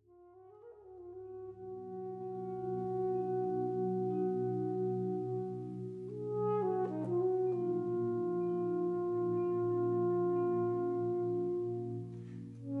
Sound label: sax